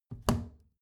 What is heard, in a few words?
plastic trash can close regular muted